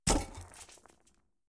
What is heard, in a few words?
ledge impact; Kismet